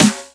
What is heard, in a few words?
Snare; 7rystan's 600+ FREE EDM SAMPLES & DRUMS; Percussion